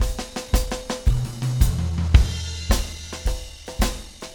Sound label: 110 intro; Big Fish Audio - DOM; SWEET